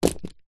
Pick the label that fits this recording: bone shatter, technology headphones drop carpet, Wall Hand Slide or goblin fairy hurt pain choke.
technology headphones drop carpet